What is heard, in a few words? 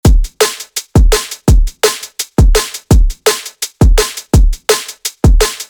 Drum Bass Drum Pattern with closed hat